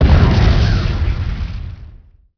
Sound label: explosion large; Emperor - Battle for Dune